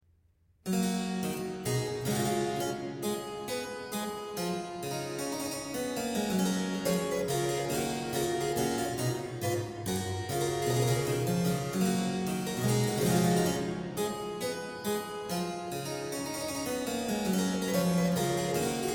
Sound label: menuet g dur; bwv deest; clavier buechlein fuer anna magdalena bach 1725